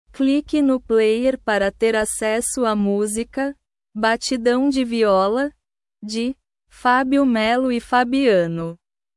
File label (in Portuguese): batidão de viola